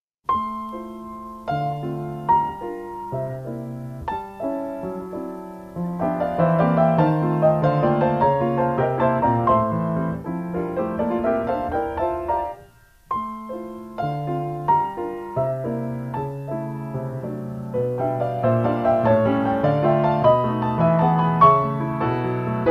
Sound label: jazz